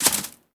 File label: Hit; moul